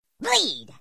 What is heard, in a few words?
bleed, grunts